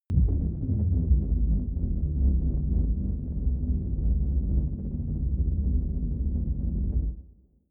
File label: space crashing craft; raumschiff